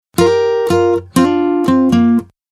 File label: Guitar; BlindShell; BlindShell-Classic-2